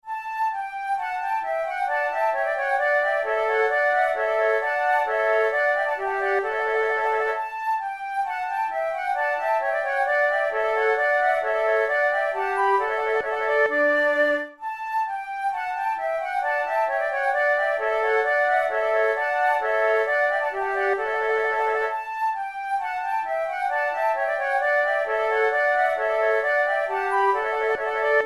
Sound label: sonate en trio gavotte en rondeau, flute